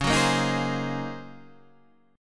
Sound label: Eb Minor 13th Db